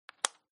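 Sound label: checker place, backgammon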